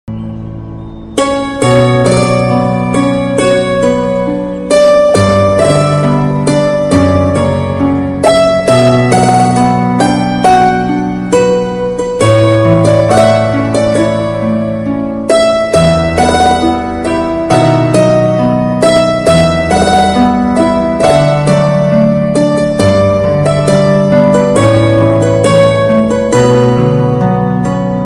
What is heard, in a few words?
mahabharat theme ringtone bgm, emotional; mahabharat song